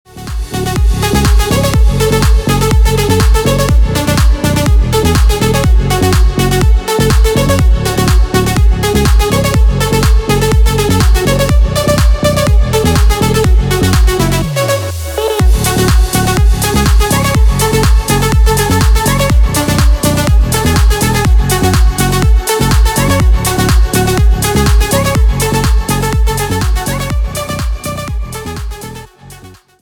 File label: future house